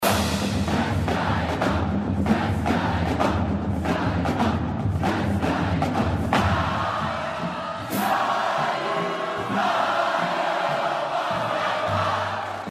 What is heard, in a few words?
cadence